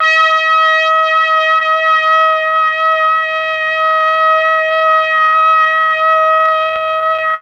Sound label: MEL BRASS; ILIO; Propeller Island; Legendary M400